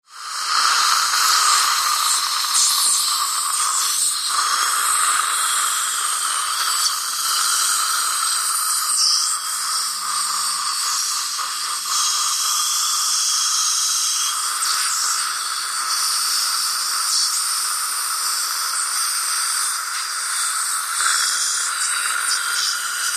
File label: HOSPITAL EQUIPMENT DENTIST: Cleaning teeth with spray & saliva vacuum.